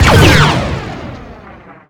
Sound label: sandtrooper blaster